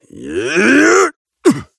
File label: melee big effort; Operative